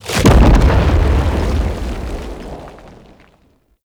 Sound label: distant cannon impact; Makvos; Warframe; Tau